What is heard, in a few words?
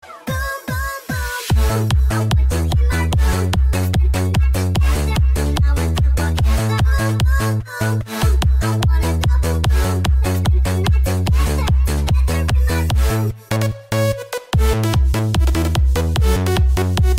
Nightcore